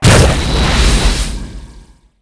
impact; Quantum Redshift

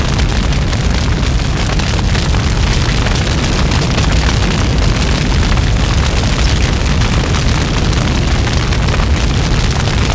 Fire Large; Max Payne

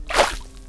Gurgle; Finding Nemo; XBOX

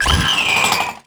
skate collect; Tony Hawk's Project 8; XBOX

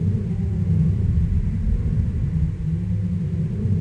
mine wind LOOP; Cars; XBOX

